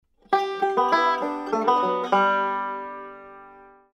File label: Banjo Ending